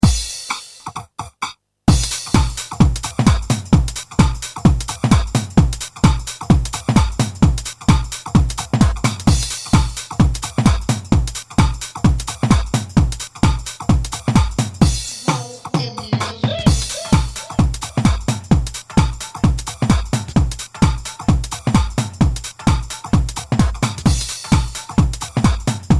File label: Bonus Beats